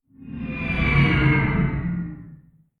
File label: outro transition; time jumper